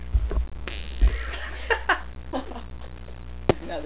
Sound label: little fart